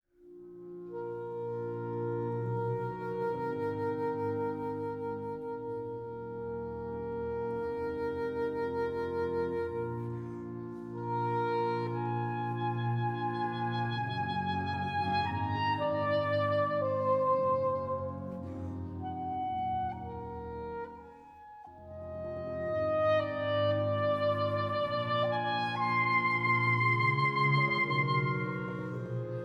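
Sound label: Soprano Sax Concerto, Wind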